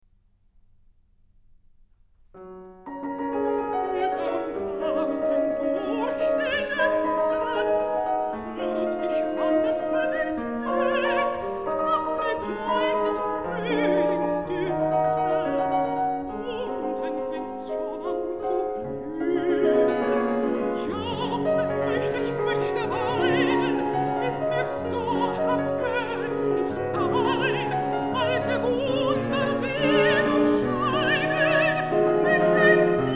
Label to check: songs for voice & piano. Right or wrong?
Right